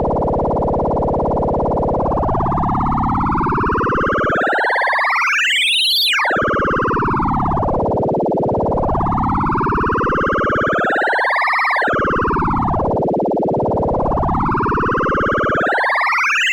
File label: A114 sweep; CGS; Mod-Sweep